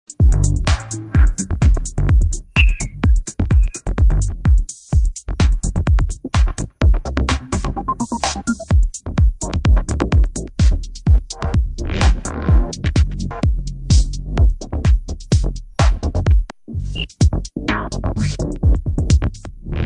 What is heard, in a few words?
acid test